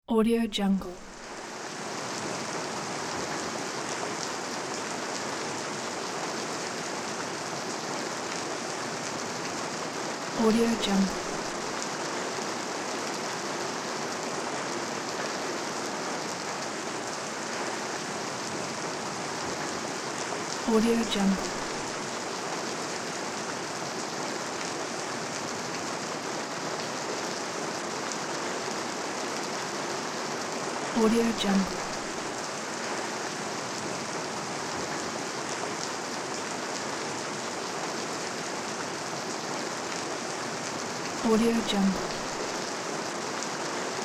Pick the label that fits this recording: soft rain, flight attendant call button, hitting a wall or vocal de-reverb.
soft rain